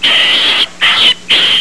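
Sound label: squeak, rat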